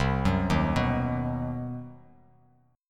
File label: C Minor Major 7th Flat 5th chord, Bass Standard